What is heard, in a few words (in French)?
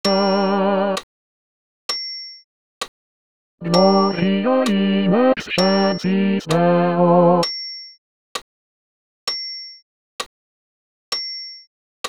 bas voc acc; Gloria